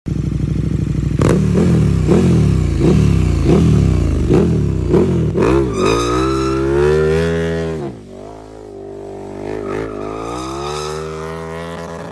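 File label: Motorcycle handle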